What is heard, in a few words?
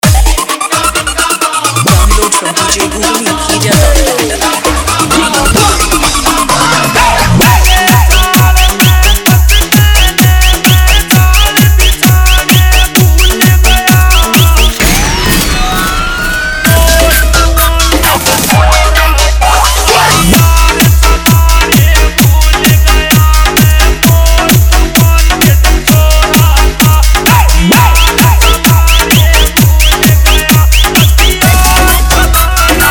Siren Bass Remix